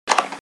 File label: se box op